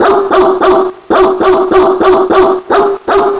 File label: deep bark